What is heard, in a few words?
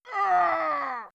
Death Player; Basic Game Sound Effects Pack 1